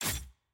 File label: aah; evelynn